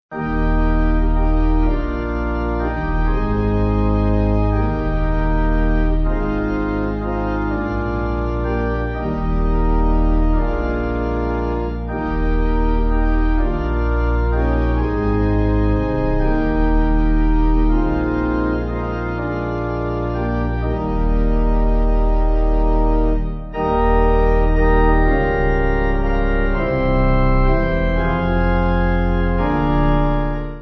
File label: Pipe